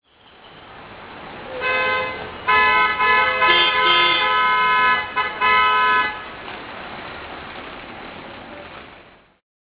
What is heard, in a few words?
ringer; Ringtones